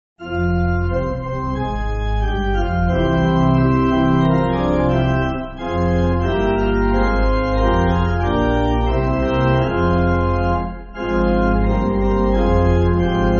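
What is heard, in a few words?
Pipe Organ, Backing Files for Churches; The Years Fly By; Swedish; Swedish folk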